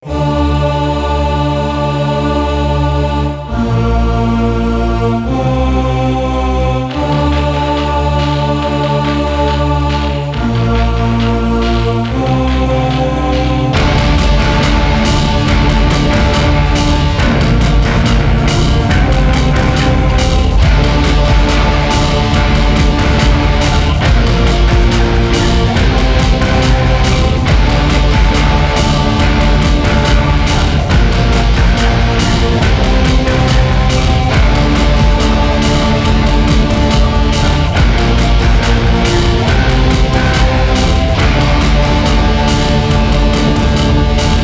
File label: Music INDUSTRIAL